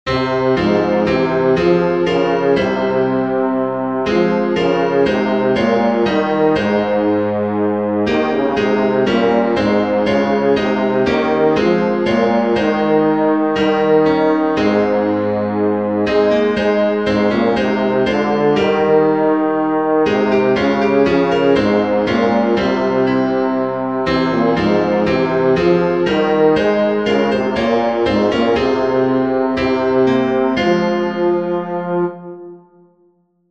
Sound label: leoni bass